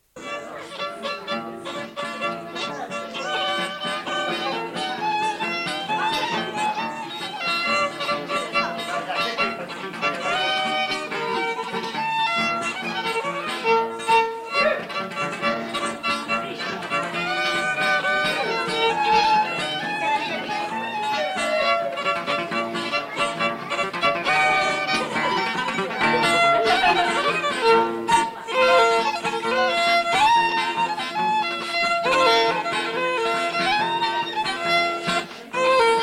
violinist, guitarist, dance caller, Emilia, Trescone (Folkdance); Riolunato artisan; ALAN LOMAX IN NORDITALIA